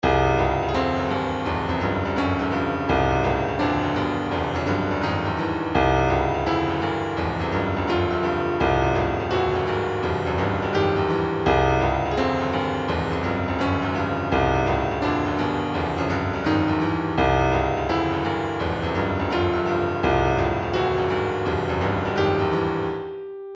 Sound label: retro funk 084; funked up